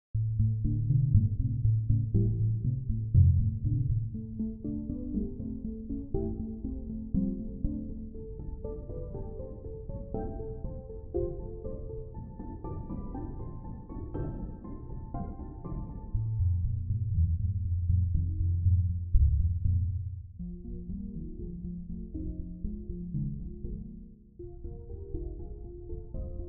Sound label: Retro; KV331